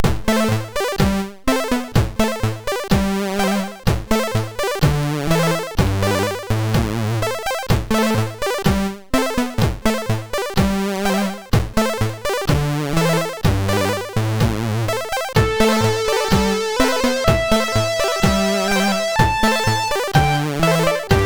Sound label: sid MOS6581R3